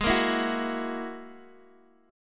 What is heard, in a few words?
G sharp 7th Suspended 2nd Sharp 5th, Guitar, Open D; down; chord shape